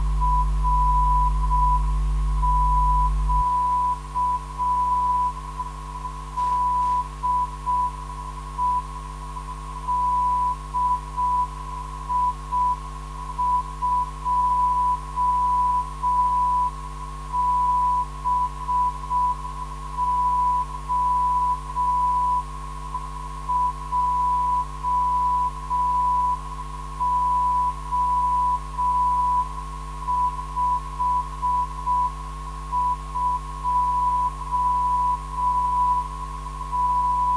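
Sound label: non-directional beacon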